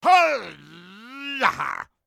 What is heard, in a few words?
Black Rage; Character Black Rage